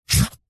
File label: office marker pen on cardboard line